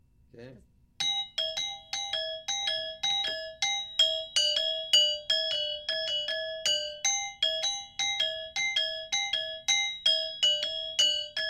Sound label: Goak Maling Taluh sangsih, gamelan